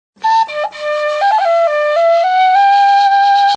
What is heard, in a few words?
Short tin flute sting; Tin Flute Sound Effects; Musical